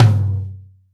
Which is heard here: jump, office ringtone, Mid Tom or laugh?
Mid Tom